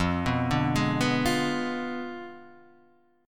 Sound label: D Diminished 7th, chord